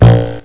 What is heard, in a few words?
TWANG